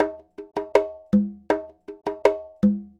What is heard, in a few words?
Conga, Latin Percussion; Sonic Foundry (Sony Creative Software); Joe Vitale Latin Percussion